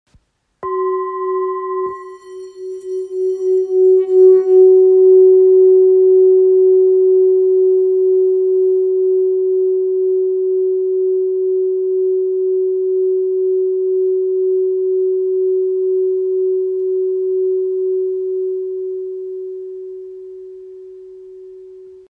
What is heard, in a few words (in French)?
Bol ancien fa diese